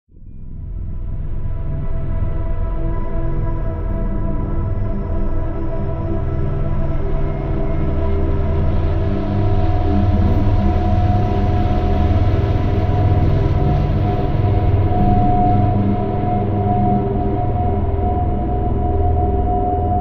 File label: Southwest Drone II